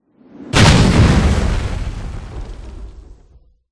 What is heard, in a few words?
crash; c-evo